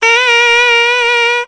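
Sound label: cornet fx; Musical Instruments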